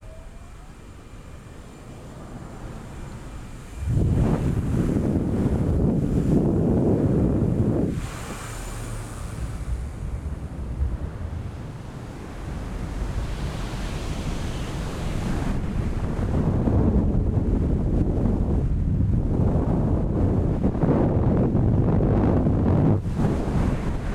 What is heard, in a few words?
Skydiver - ONBRD - FLYING - Exit Plane Jump and Flying - Chest; Pole Position; The Skydiving and Parachute Library; MKH8020